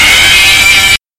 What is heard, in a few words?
screech